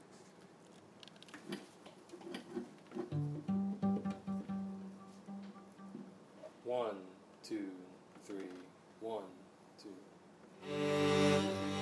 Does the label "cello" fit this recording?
Yes